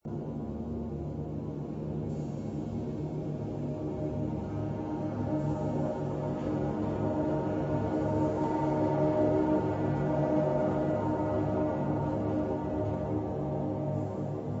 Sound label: Bertoia Clear Sounds